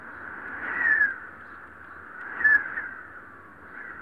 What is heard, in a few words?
Wind Whistle Loop; weather fx; Silent Hill Downpour